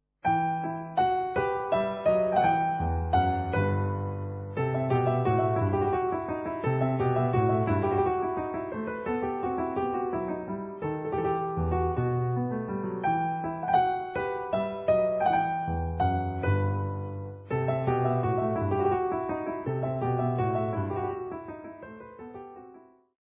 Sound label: Menuet